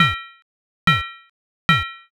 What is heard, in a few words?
blacksmith